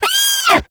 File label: Snow Critter Vocal Pain; Hunting Critters